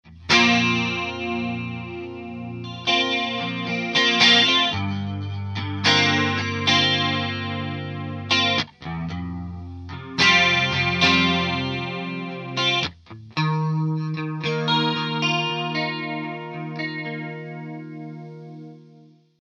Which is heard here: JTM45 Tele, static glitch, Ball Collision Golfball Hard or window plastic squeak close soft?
JTM45 Tele